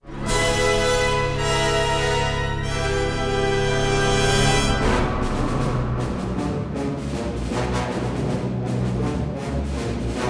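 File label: battle loop, music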